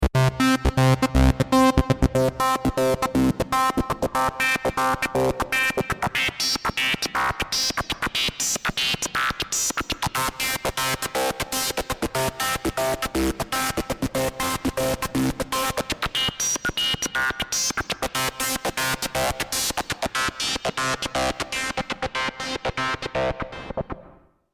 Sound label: Virus TI2 Wave Shaper